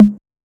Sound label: Tom Hi, 606; classic machines